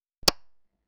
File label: eltronic microphone mute; audio equipment